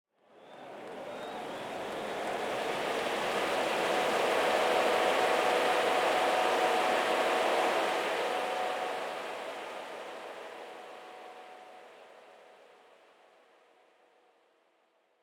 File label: Crowd Ambience; Pitch Black Audio Pong